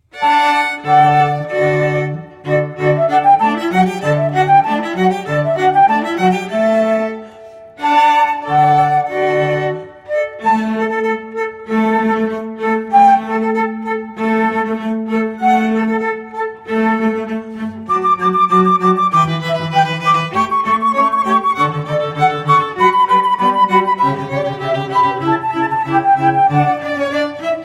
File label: Allegro in D